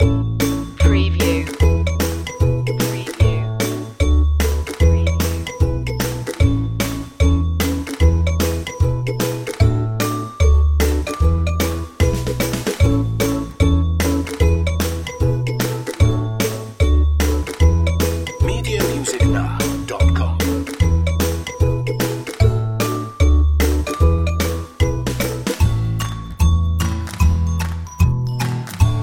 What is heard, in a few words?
yip jump loop